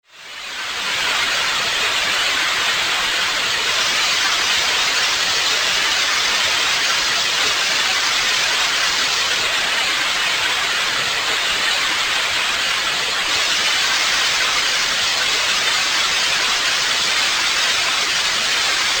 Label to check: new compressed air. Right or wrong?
Right